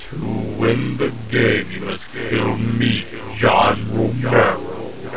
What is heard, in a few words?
reversed; doom2